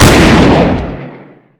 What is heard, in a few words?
shotgun fire; gesource; weapons